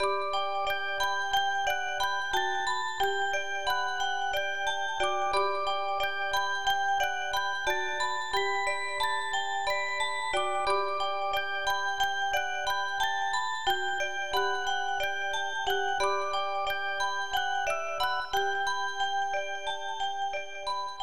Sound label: organ; Yuka; Eithea; PS1